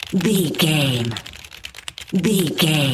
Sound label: typing keystroke sequence